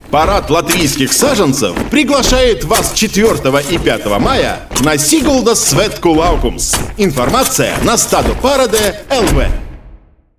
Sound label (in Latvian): audio reklama dzingls